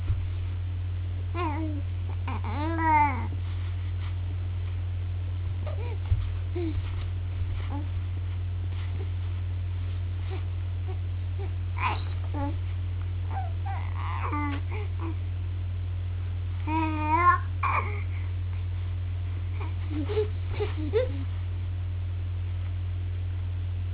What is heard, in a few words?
playing